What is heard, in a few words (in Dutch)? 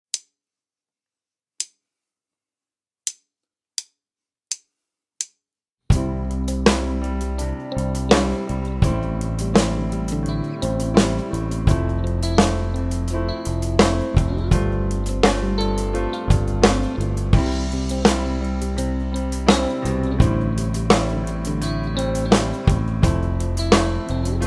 Improviseren met c a e en g